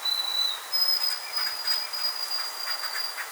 white throated sparrow

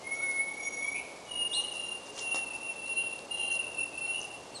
white sparrow slow

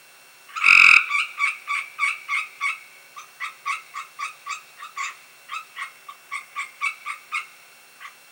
Cope's Gray Tree Frog Alarm; FROGS

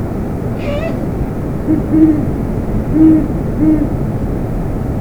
two owls; BIRDS

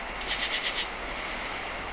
night sound

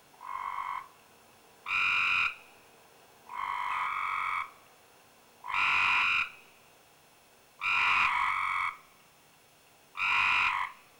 Copes Gray Tree Frog Calls; FROGS

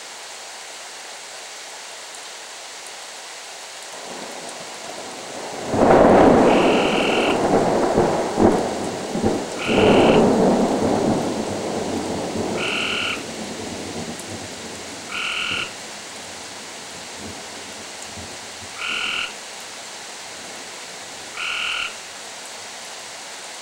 frog and thunder; FROGS

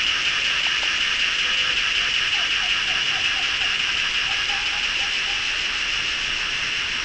slow cicadas; INSECTS

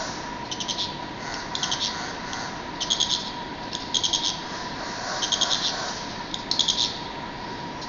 cricket slow

